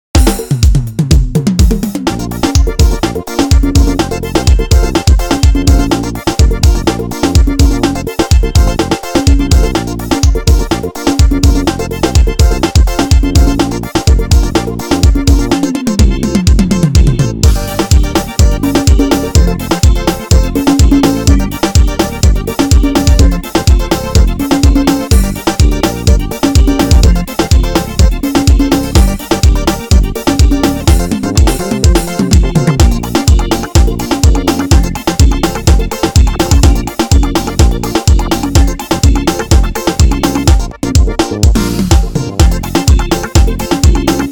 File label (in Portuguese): forro pegada rapida; ritimo yamaha